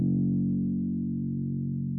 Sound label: F#1 46.25Hz 8 string; tuner